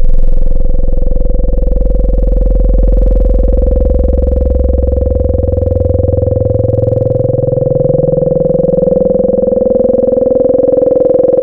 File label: Digital Formant Sweep; Synthetic's Serum Collection; Echo Sound Works Core Tables